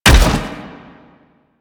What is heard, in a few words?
Step; Warframe; Sentients; Erra; Foley